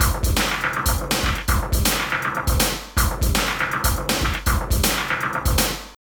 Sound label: SUBWAY SHU 162, LOOPS